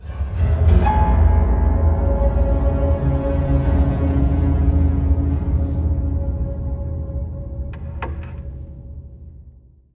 stinger; Wasteland 2

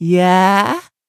Voices Yeahhh; don t be afraid the first toy

